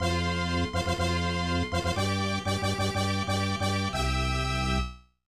Race Start; computer nouryoku kaiseki ultra baken 1995 snes

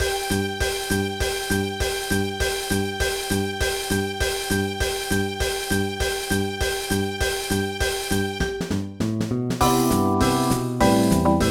Jazz Stage (Karaoke); pinky street kira kira music night pop town gamerip

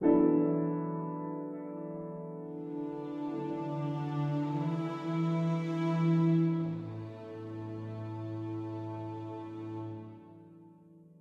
Piano

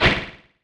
dodge

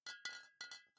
Bottle Shake; The Town

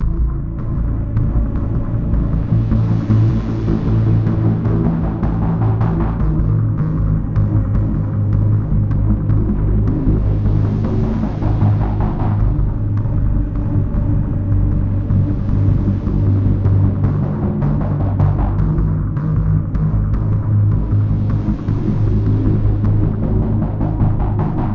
survivor tense; Identity V

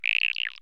Creatuer; Gonner

